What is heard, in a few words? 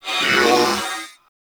Purgatory Warrior Charge Scream; Warframe